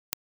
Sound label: impulse single